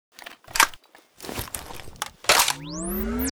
gauss reload; STALKER

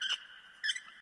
ambient lamp squeaks; STALKER

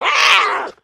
hit; STALKER; customnpcs

